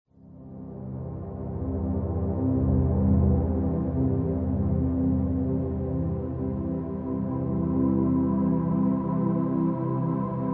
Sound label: Floating atmosphere, Tinker bells; Outer Space - Floating; Ambient SoundScapes